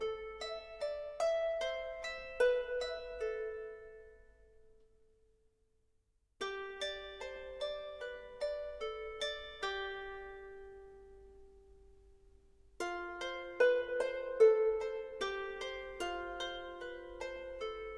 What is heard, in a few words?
Sad Chat; Steamworld Quest Hand of Gilgamech; nintendo switch